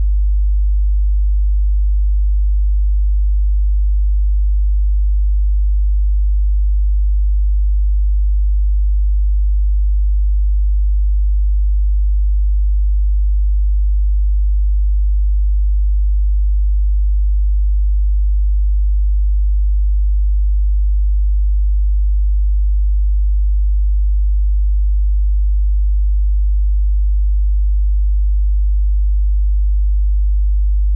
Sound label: Sine 50Hz 0dB